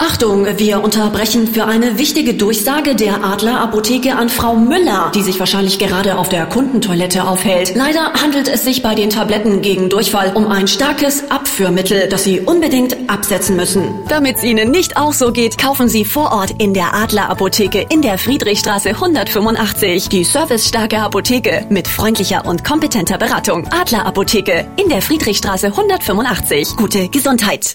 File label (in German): Pfeifer; Adler Apo